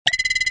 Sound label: electric